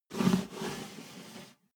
step barefoot metal slide long

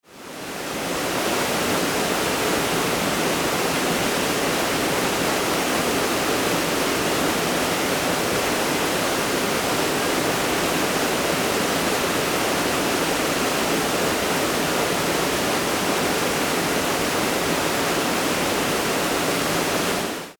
river rapids under a bridge

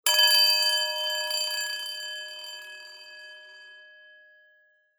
japan rin bowl 3 crash

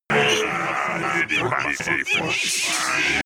vocal glitch

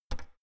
oven button press

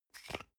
toothpaste screw cup open medium single

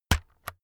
keyboard external key hit slow

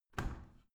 washing machine modern close

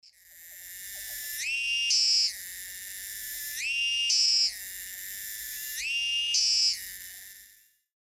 cicadas saw

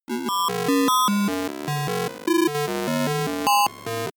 broken computer low long

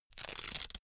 velcro large open

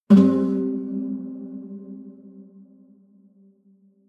low string buzz dissonant

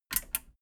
metal button radio click power on slow

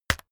keyboard external key hit very fast

